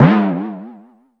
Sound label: Comedy Timpany Sound